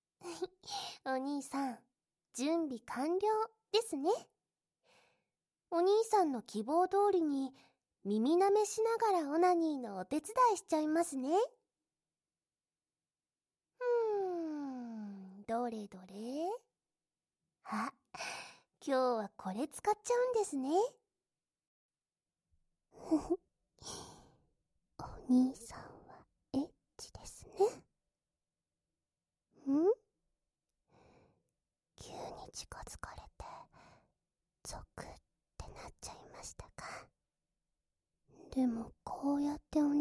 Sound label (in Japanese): 耳舐め編 左, ASMR